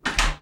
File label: electronic stapler